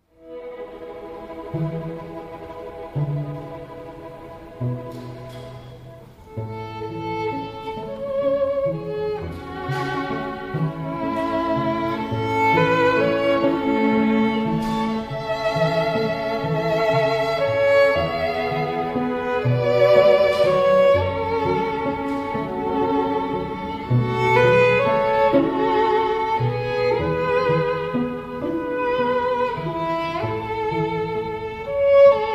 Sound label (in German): streichquartett; o mio babbino caro